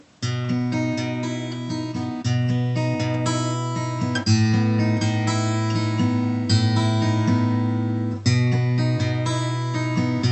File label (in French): strum leger Jumbo